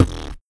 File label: Beat Box Kick